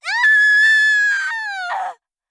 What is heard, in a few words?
voice woman scream